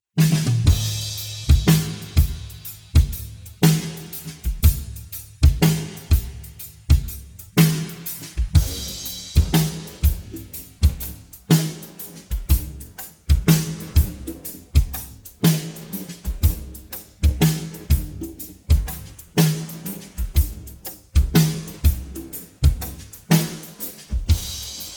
drum intro slow

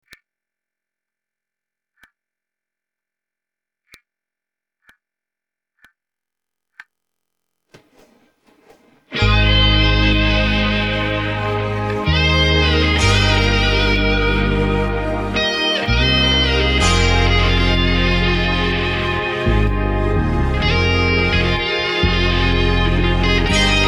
drumless

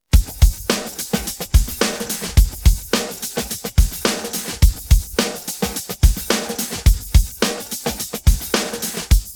pattern heavy, drums

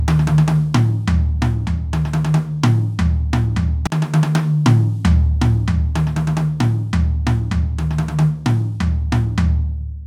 roland jungle, drums

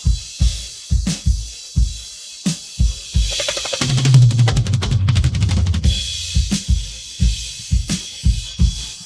roll, drums; Fill

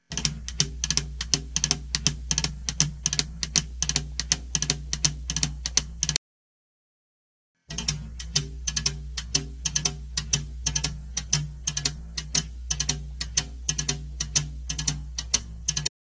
intro rim, drums

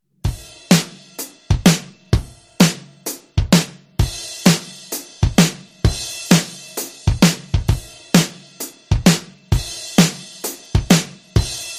karaoke

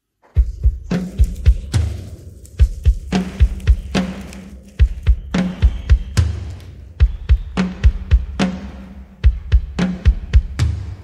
pattern intro, drums

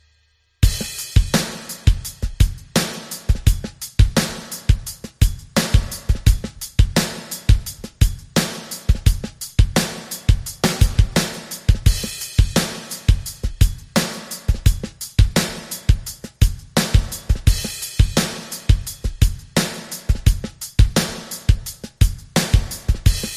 drums karaoke

